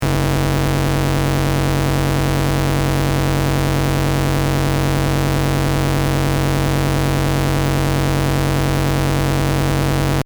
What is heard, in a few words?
paper synth fm test